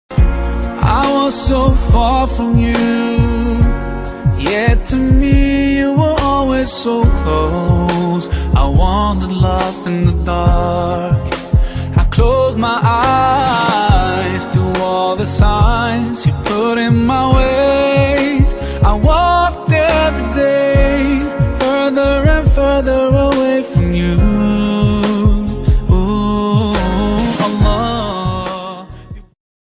arabic ringtone

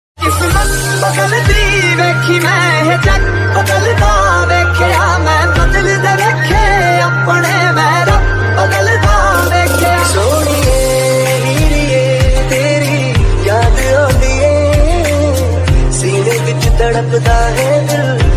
mashup, panjabi ringtone

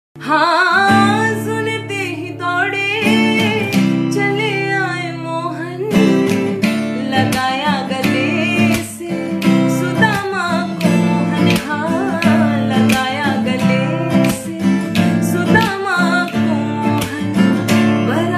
devotional ringtone, hindi devotional ringtone

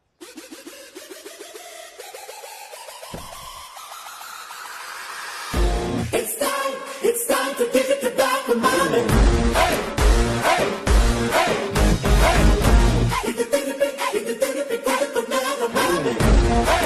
Entry BGM tamil ringtone; Varisu

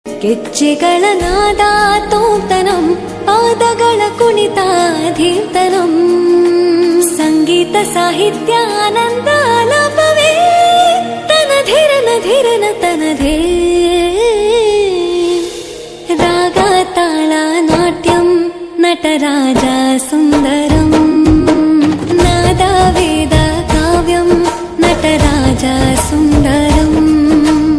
Tamil ringtone

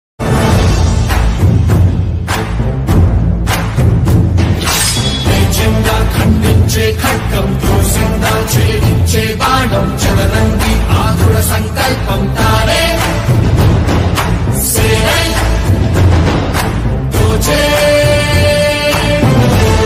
BGM ringtone, tamil ringtone; Bahubali

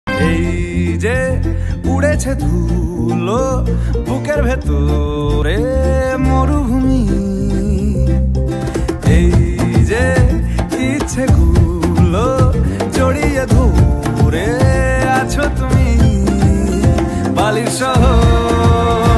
bengali ringtone

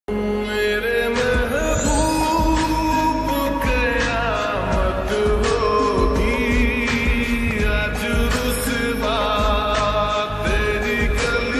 lofi remix hindi ringtone

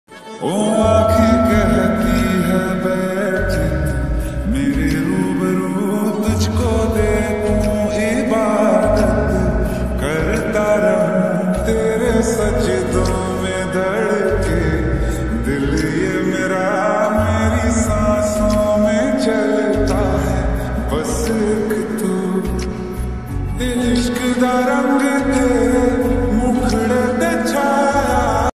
lofi remix hindi ringtone

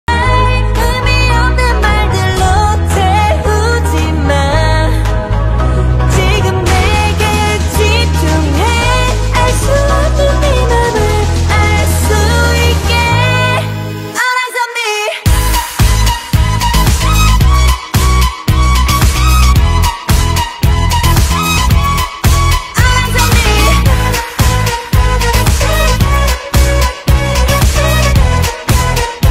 ringtone

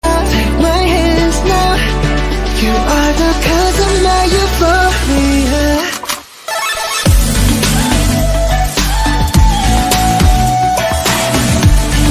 korean ringtone

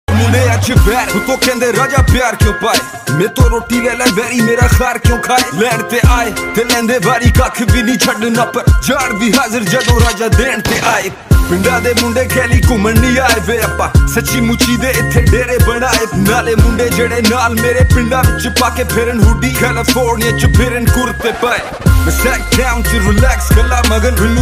Rap ringtone, hindi rap ringtone

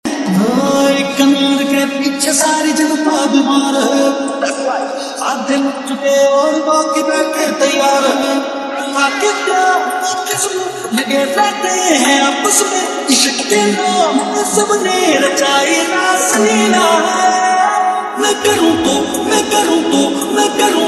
slowed hindi ringtone

